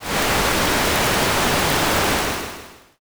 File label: fire